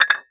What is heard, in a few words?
IMPACT Brick Vs Brick Mono